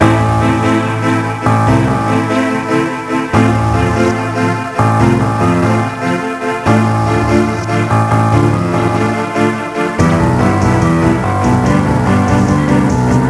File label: Start Music; AmoebArena